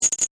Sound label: Digital Crackle, Zap; BlastWave